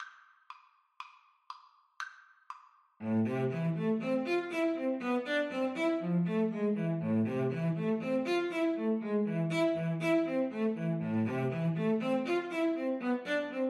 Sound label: Violin Cello Duet; dvorak op98